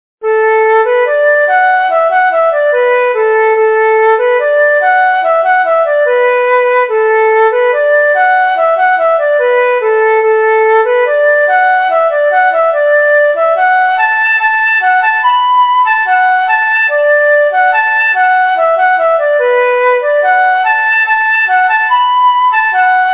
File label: broken fiddle